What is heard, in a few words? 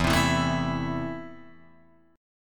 E Suspended 4th Sharp 5th, Guitar Open G